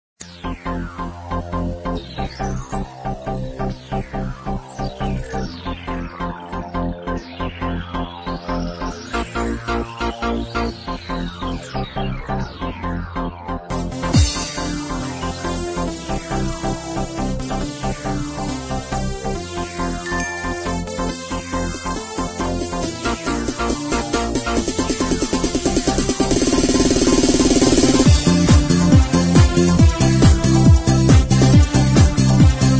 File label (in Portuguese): Parabens Pra Voce Dance Instrumental; Comemorativas; Aniversario